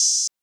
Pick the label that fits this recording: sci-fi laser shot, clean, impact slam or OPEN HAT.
OPEN HAT